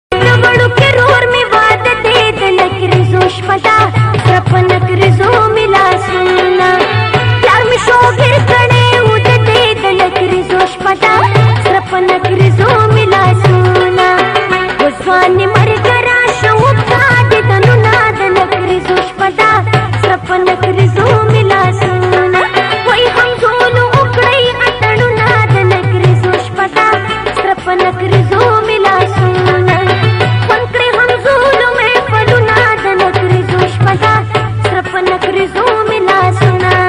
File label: Pashto Ring Tone